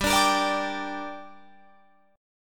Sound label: G Minor G down Guitar Open F, chord; Gm strummed